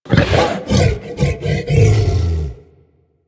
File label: Juggernaut Truffle Fidget, Infested; Warframe; Truffle Hunt